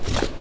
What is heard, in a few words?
slide